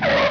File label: BRAKE; Screen Wreckers